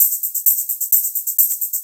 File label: hihat 2, 130, drum loops